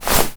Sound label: run foley